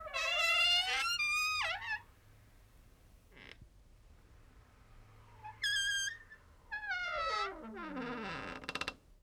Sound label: Squeaky Door Slow